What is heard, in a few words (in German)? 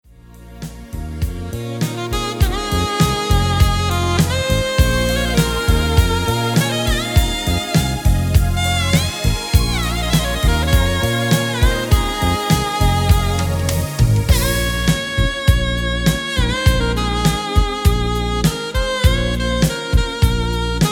tanzband sax lounge